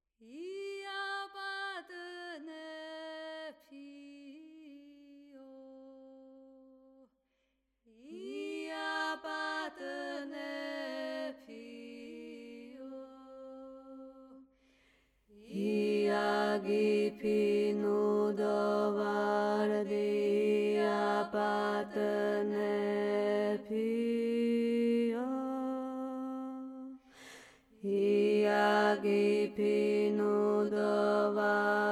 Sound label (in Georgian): ია პატონეფი მეგრული მესამე ხმა; გალობის უნივერსიტეტი; სასწავლო